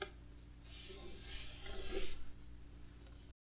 intro outro

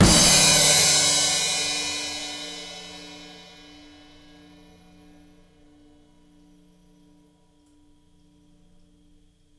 crash; Mattydee

